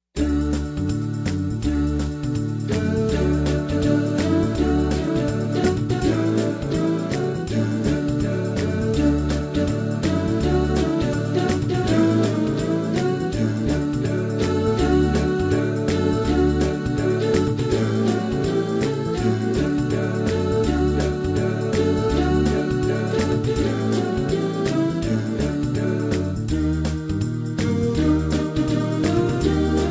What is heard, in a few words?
music; nehemiah